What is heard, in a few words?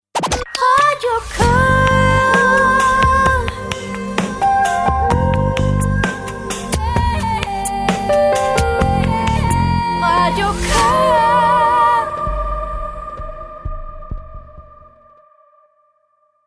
jingle; Radio Koge; produceret af Lydstudiet Aros